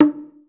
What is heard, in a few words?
LOG DRUM; AKAI S-Series CD-ROM Sound Library VOL-5